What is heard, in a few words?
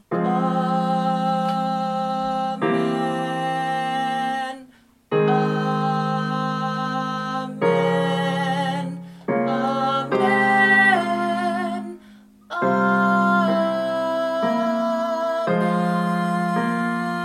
Amen Alto, Christmas Choirs